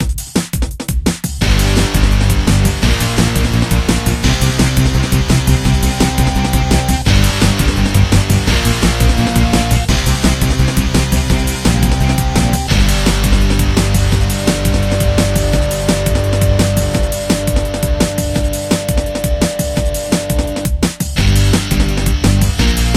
Title Splash Music loop; Nickelodeon Super Brawl Universe; Android

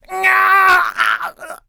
Enemy Generic Human Death Eaten

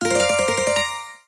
Sfx Tennis

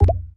ui generic error

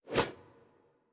Human Hunter Throw

